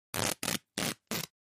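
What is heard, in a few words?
Cloth Split Rip Tear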